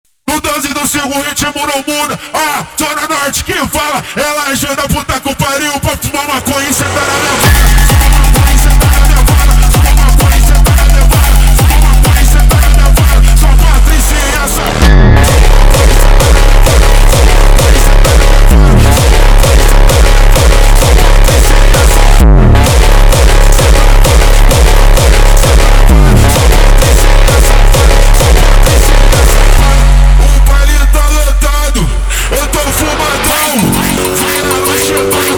hardstyle

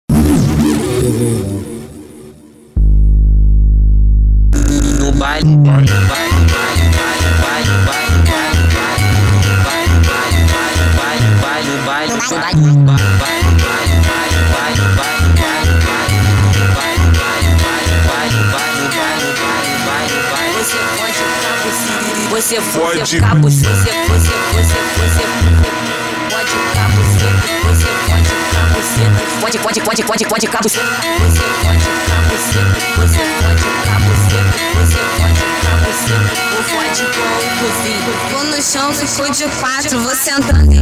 Vocal Ritmada sped Up, funk

